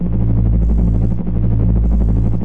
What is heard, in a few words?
superphys hold loop; svencoop; hl2